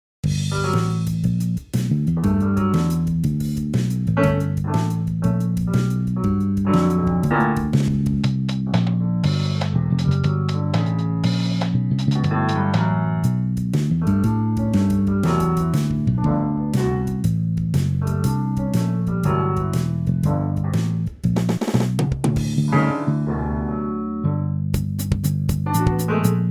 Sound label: Improvist